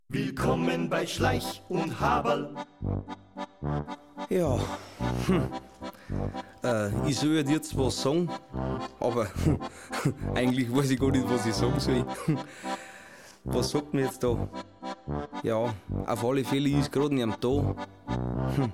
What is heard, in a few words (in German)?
warteschleife